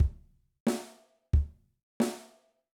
Quarter Note Example

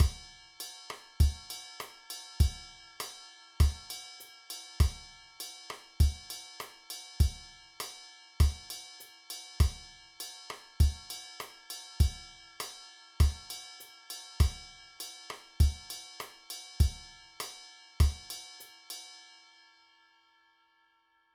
Mambo Beat, drum

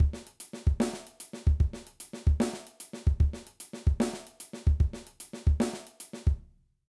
Impressive Beat

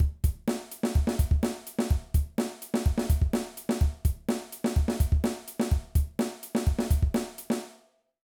Rock Drum Beat

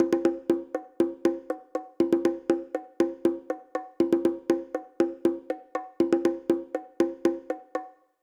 Bongo Pattern

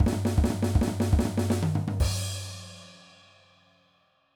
Punk Fill, drum

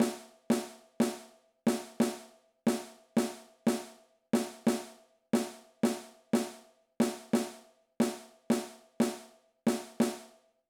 Repeating Rhythms, drum

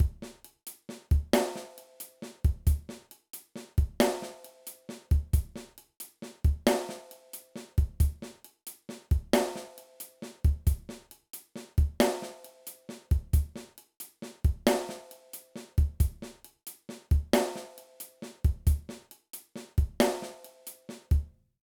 Intermediate Drum Beats

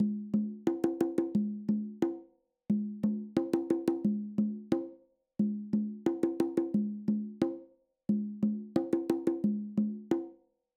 Conga Pattern

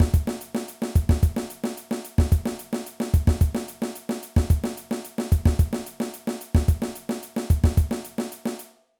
Punk Beat

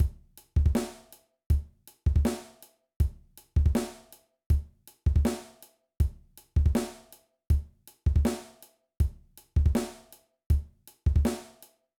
Kick Drum Technique Exercise

